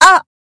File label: pain; astra